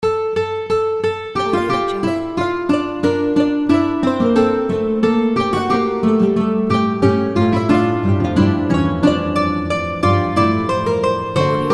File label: old baroque lute; epicmann